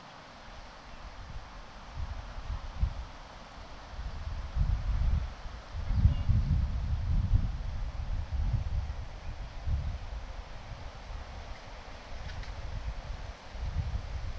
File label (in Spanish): yucatan santa elena uxmal piramides; mexico